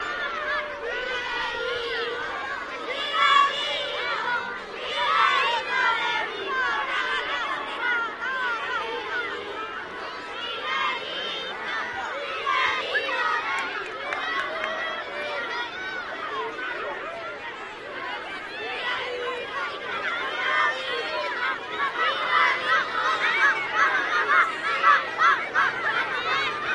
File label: Crowd Spanish Kids, kids play